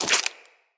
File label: Root Spider Foley Leg Swipe; Animals